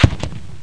grenade launch; weapons&explosions

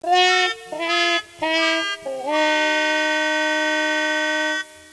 horn; PUPPIES

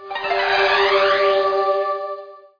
shrine; Heretic II; items

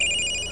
BLIP

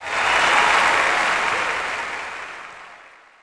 Applause; solitaire haven

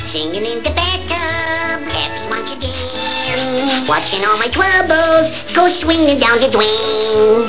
singin in tub; TWEETYBI

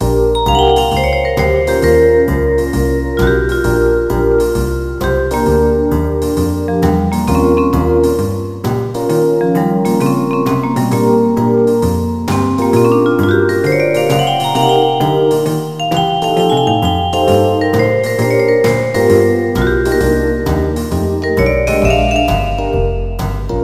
blues; Song Book

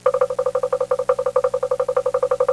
blocks loop, percussion instruments; Sound Effects for Multimedia - Volume 1

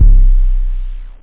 jungle; esic kick bass

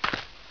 gravel walk; Player; Heretic II Demo

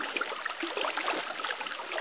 Swim Idle; Player; Heretic II Demo

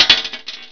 brass metal; SpecOps2 MPlayer Demo

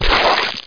tear cloth; Heretic II

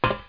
metal walk; HereticII-Demo; Player

